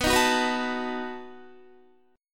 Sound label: B 7th B down Guitar; strummed